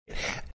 death rattle

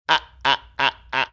laughing stilted

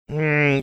"hrmmmm"

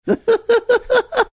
cute laugh

